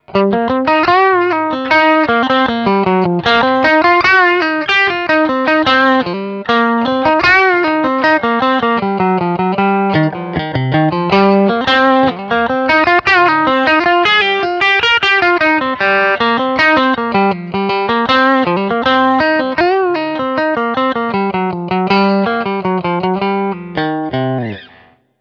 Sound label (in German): Steg lick